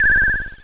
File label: chirp